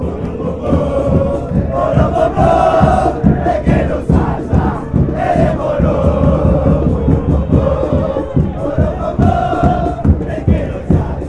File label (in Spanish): chant, argentina fanchants; deportivo merlo